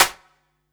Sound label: Claps & Snares